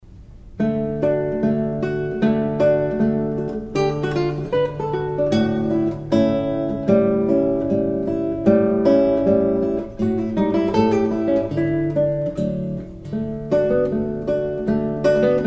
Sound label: Schottisch, guitar; Satanella Opern Revue No.28